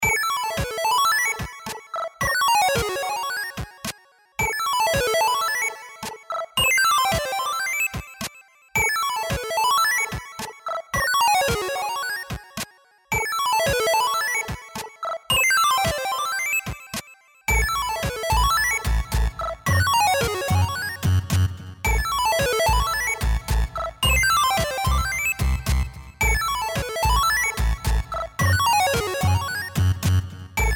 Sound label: Menu; Doodlegod8; Doodle God Puzzle Collection